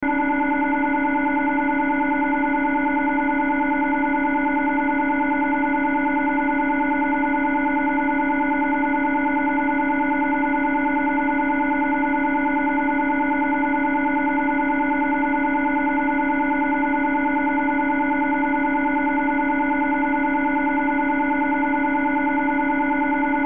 Sci Fi Laser Beam Ray Stream; Lasers

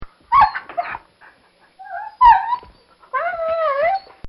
Dog Crying In Pain; Dogs; Animals; Bobby Cole

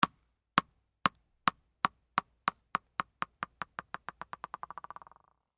Sports Tennis Ball Drop Bounce; SFX Bible

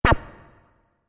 Multimedia Button; Button Sound Effects; Business Programs, Apps And Computing Noises; Andrew Fleming

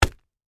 Sparring Glove, Impact, Hit, Punch; Sports; Boxing; BlastWave

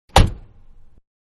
Foreign Car Door Close Exterior; Vehicles; Car Foreign-Door Close-Exterior; Cars; Manhattan Production Music

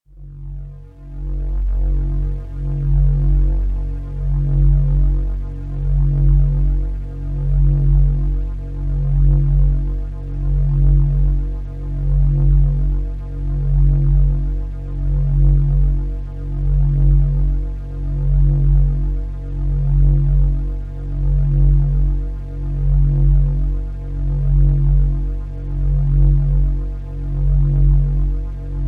Spaceship, Spaceship: Ambience

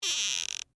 Door Squeak Wood, Foley, Door, Squeak, Wood; Shower Door Sound Effects; BlastWave

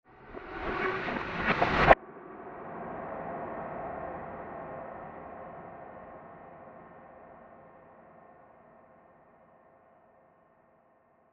Absorptive Science Fiction Transition; CraftedSignals

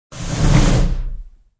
Dresser drawer being shut.; Household

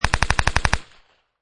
Machine Gun Thompson Machine Gun Long Burst

